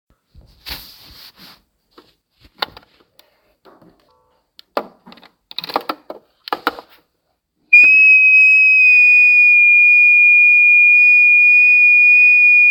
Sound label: a warning shot